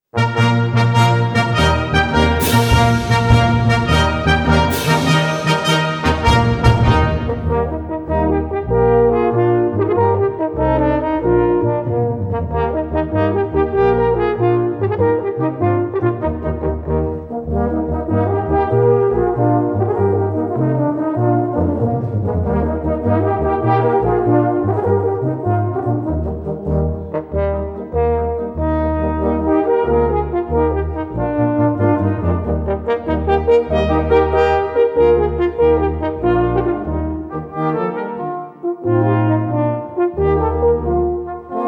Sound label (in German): Horn Und Brass In Eb Dur